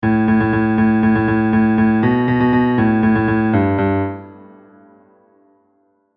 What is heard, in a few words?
mel 2bar bass; music vae